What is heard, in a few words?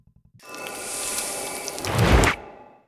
mutant sweep